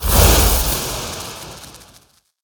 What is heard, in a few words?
Thunder Lizard Attack Underlay